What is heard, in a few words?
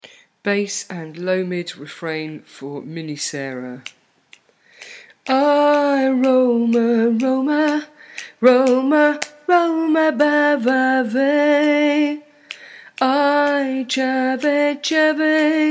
lo mid and bass plus refrain; Mini Sara